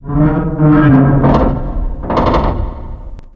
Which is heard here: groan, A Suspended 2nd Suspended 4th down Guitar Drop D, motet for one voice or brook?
groan